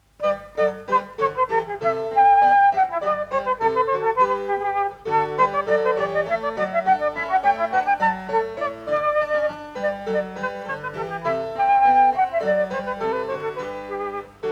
Creamsonata G major - V. Menuet; Op.1,5

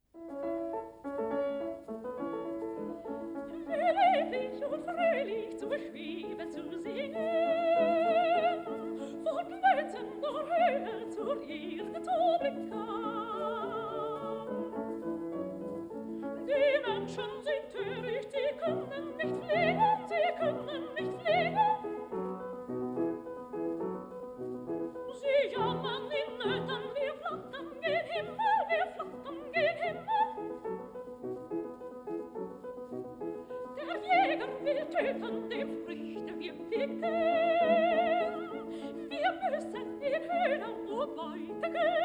soprano; Schubert; Die Vogel - D. 691; Liebhaber in allen Gestalten - D. 558; Lieder on Record 1898-1952

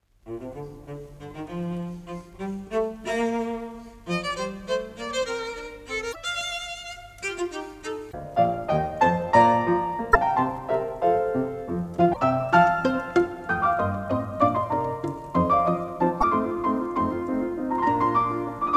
Trio No.7 in B flat major - II. Scherzo Allegro; Beethoven; Op.97

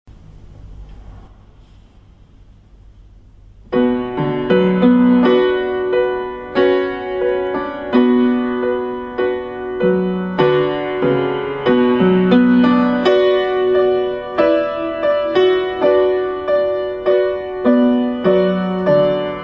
Prelude In B Minor; Chopin; Op. 28

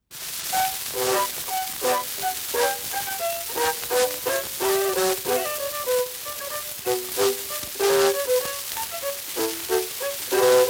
Partita In B Minor Bourree; Bach; Joachim and Sarasate

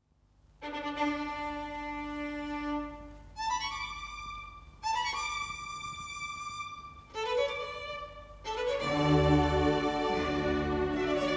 Concerto Grosso, D major - I. Largo; Handel; No.5, Op.6

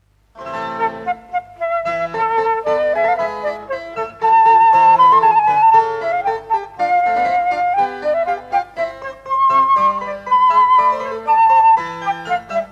Creamsonata E minor - I. Larghetto; Op.1,1

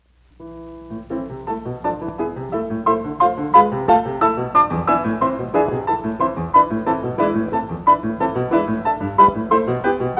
Etudes - No.4 in E minor - Vivace; Chopin; Twelve Etudes Op.25

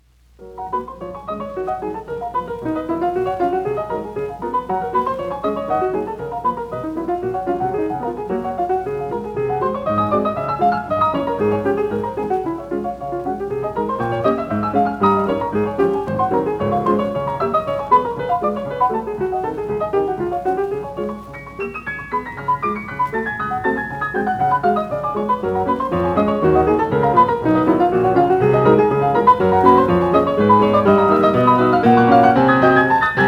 Etudes - No.9 in G flat - Allegro vivace; Chopin; Twelve Etudes Op.25

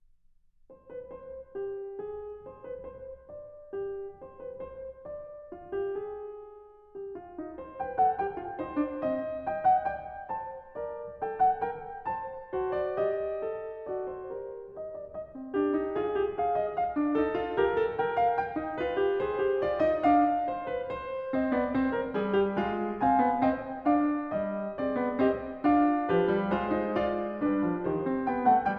Well-Tempered Clavier, Fugue No. 2 in C minor; Book 1; BWV 847; Bach

